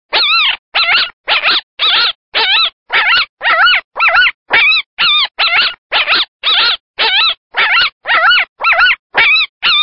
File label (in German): welpen, fuchslaute; fuechse